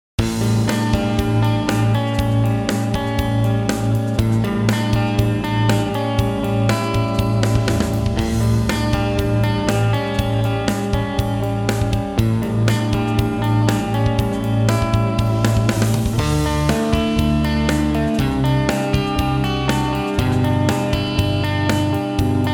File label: tonex clean